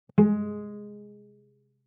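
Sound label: Cello pizz fortissimo sul D Ab4; MIS Pitches - 2014; Strings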